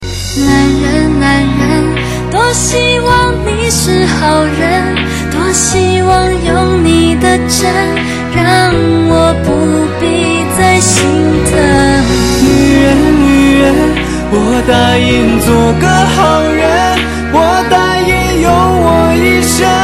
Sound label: Chinese Songs